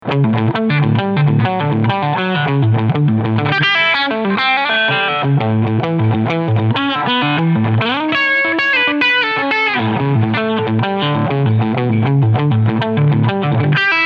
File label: Quilter Superblock UK AC Top Boost Full Gain Limiter